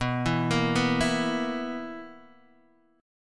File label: B Major 7th Suspended 4th B down Guitar DADGAD